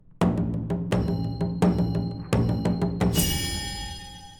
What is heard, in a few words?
Rousing rhythms; Dark and Darker